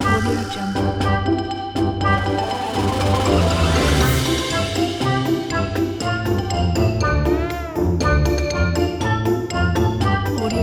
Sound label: for cartoon soldiers